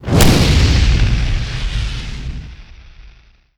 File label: spawn; t6 fastfile mods; zm ai pack; brutus; mechz and brutus